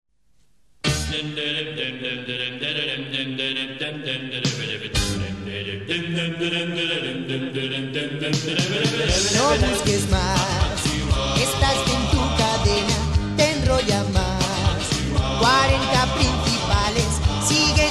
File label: Jingles; 40 Principales; Tennessee